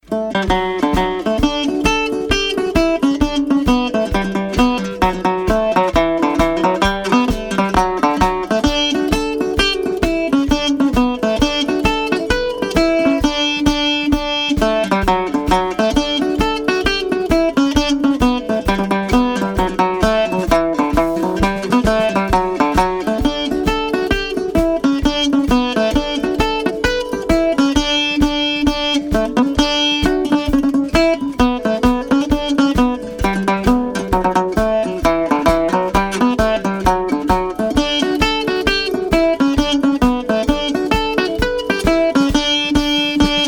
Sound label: Liverpool Hornpipe